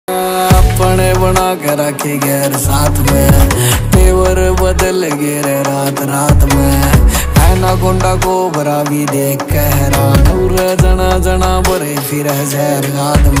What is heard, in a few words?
haryanvi ringtone